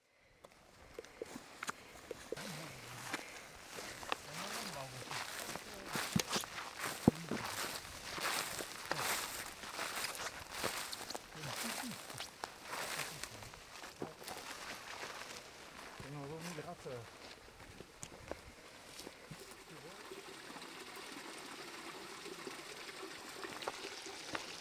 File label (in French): rando ambiance